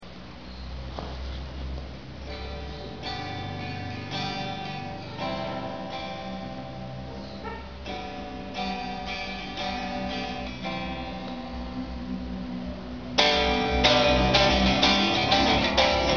acustico

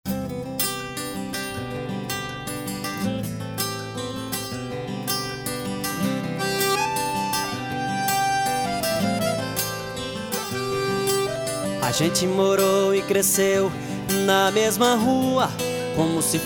sertanejo universitario

